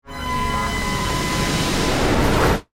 Stinger Descend, Production, Element, Stinger, Descend, Sweeper; Stingers; BlastWave